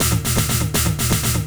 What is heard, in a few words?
Wabble, Percussion